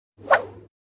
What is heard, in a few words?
swish single; Asian Show Sound Effects